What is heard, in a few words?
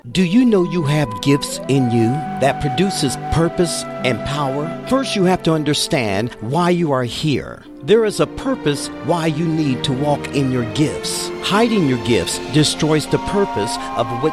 Motivational